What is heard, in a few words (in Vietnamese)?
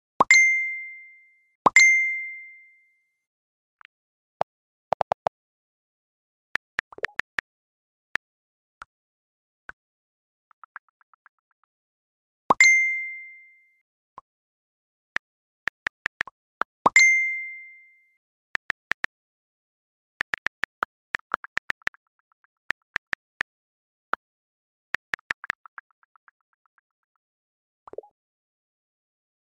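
Tiếng đang soạn tin nhắn messenger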